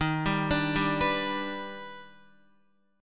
Eb Augmented Major 7th Eb down Guitar; Eb+M7 arpeggiated; JGuitar

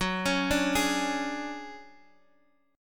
D Major 7th Flat 5th

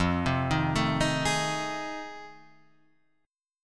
F 7th Sharp 9th; F7#9 Chord {1 3 1 2 4 4}; arpeggiated

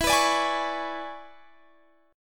E 13th; strummed; x,19,21,19,21,21

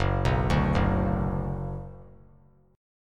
Gb Suspended 4th Sharp 5th Gb, down; arpeggiated; JGuitar

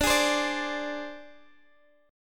Ab Major Flat 5th D

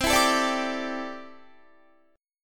C 6th Add 9th C down Guitar; C6add9 strummed

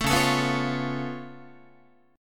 Db Augmented 7th Db down Guitar; strummed; JGuitar

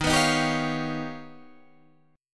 D sharp Augmented 9th D sharp Guitar Open D

